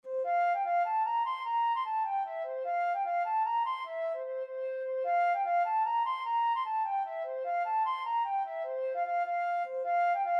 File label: flute